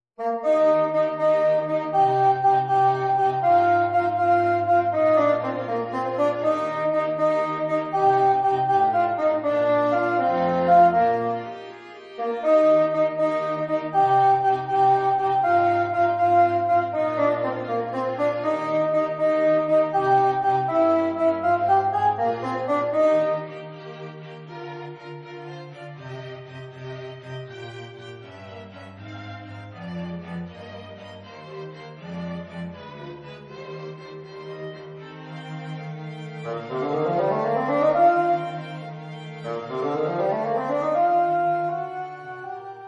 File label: Quartet